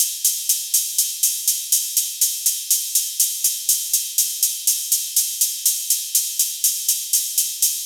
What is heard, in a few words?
122 BPM Ride; mmb studio melodic